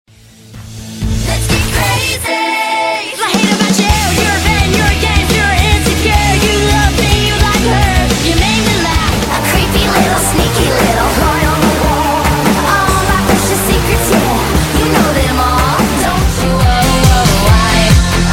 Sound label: Rock